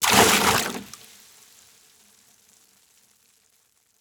Liquid Water Pouring Into Water In Plastic Bucket Heavy Short Fast